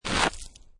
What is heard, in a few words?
Snow And Ice Ice Scrape With Ice Fragments